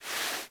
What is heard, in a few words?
Kubrow Foley Idle Paw Swipe; Enemies; Warframe